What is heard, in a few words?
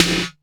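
snare drum, Hard & Loud Techno; USB Soundscan vol.01; AKAI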